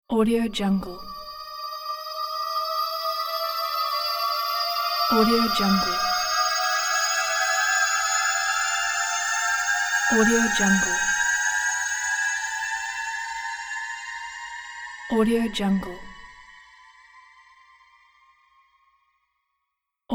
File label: teleport